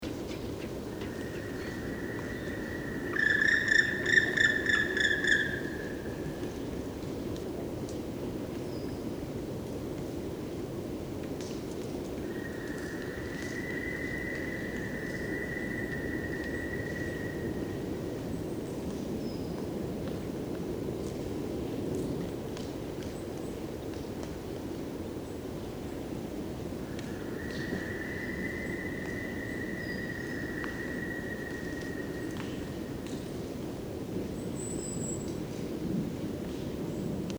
Schwarzspecht; Obelfing EBE; Michle Pron

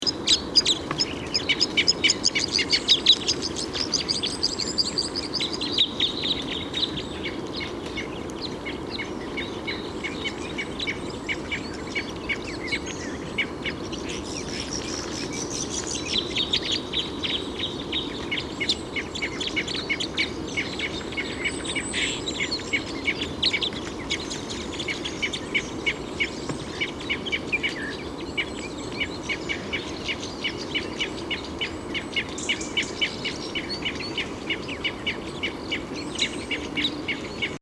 Fichtenkreuzschnabel; Kaeufelkofen LA; Michle Peron

